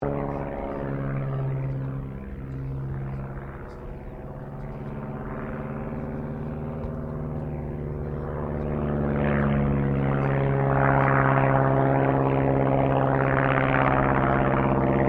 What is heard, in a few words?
AIRCRAFT DH82A DEHAVILAND TIGERMOTH PASS VERY SLOW; 1942 Tigermoth; Airplane; Airplanes; Vehicles